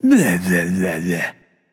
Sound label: nv tongue; smite; voicepack; hachiman